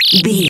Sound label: retro bird critter call squawk